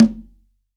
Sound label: CONGA, STEREO CONGA